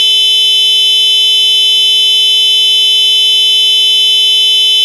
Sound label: disc high, horn